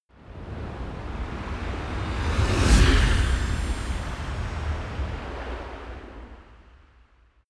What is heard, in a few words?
whoosh magic gust